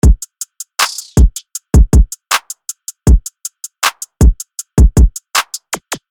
Flex Loop

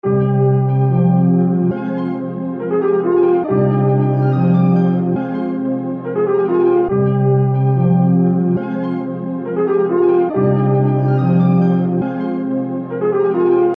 Flex Melody Loop

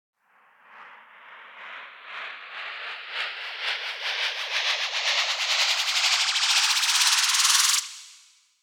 Noise Up; Unit Rcrds

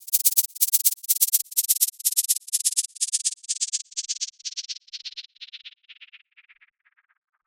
White Noise Down 125BPM; Unit Rcrds

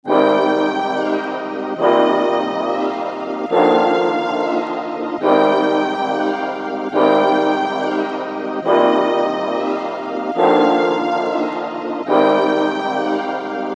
Melody Loop

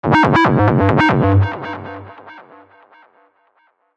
808 Slide

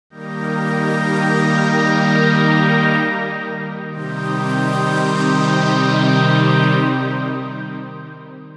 PAD

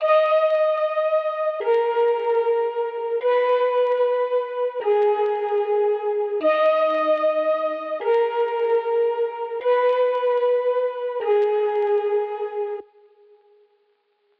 Drill

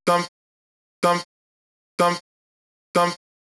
Vocal Chop 125BPM; Unit Rcrds

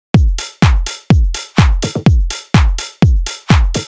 Full Drum Loop; Unit Rcrds

